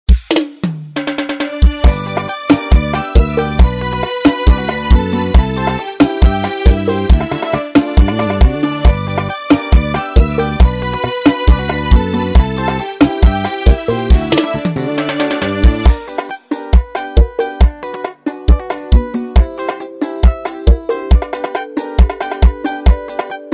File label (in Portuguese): karaoke de arrocha